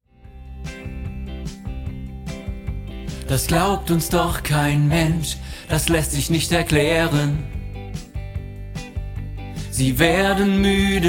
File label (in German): Lern-Tenor